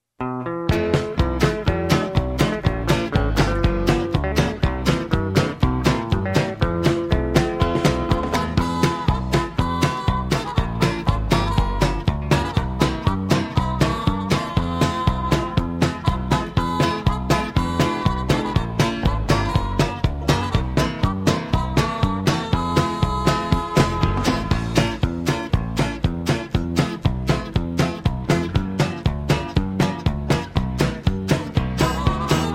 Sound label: music